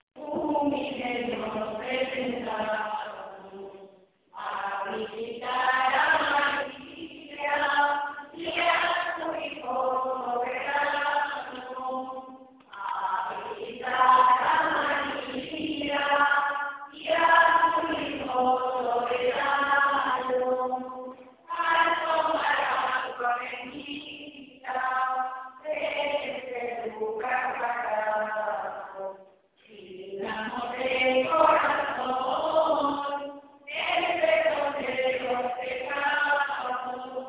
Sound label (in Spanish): Canto Del Ramo